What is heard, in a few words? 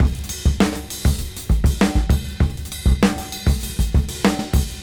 99 BPM; AMG; Keith LeBlanc Phat Butt Jams